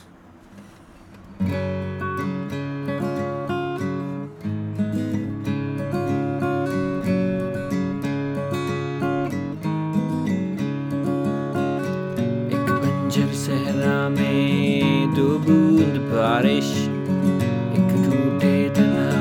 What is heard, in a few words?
acoustic